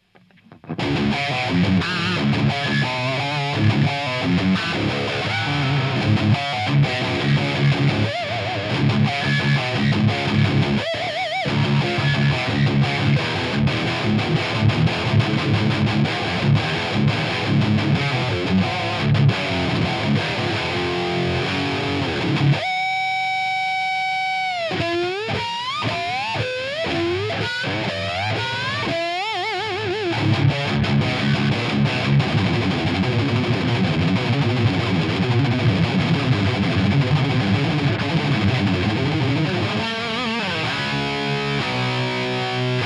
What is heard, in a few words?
Engl RB Tuning lead